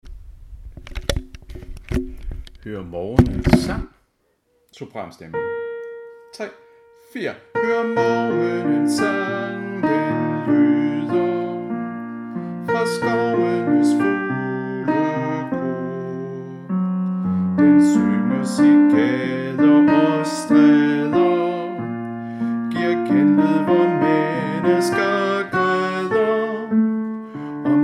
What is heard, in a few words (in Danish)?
sopran; Hør morgenens sang